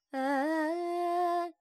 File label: Pentatonic, Vocals, Carnatic Female, Alaps, Dry; Sample Banks